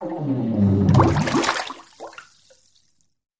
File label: Bathtub Fart Lng St